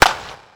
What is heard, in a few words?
Baseball Bat Crack